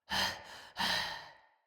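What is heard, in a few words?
Cry Heavy Run Female; Battle Barks; Envoy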